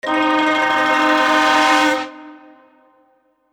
Cartoon, transition

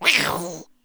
angry wild cat roar; gorbe

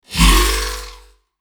Aggressive, transition

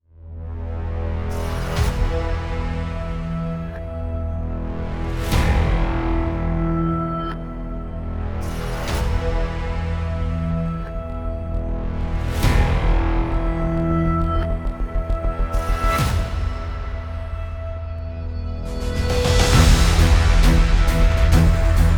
epic trailer

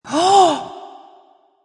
shock

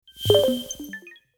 Cyber, sound effect transition

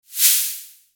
Swoosh

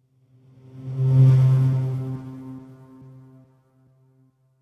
Ambient, transition

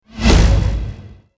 Cinematic, transition

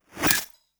Glitch, noise

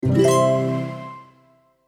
Simple, transition

